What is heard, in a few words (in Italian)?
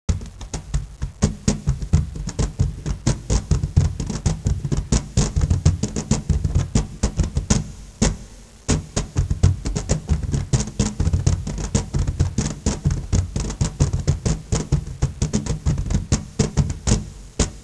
suonerie musica ritmica; rhythm